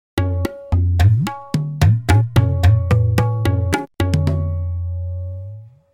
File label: tabla